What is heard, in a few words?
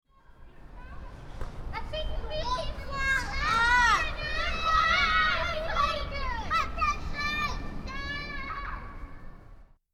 Playground